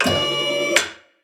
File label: robotic arm; soundscrate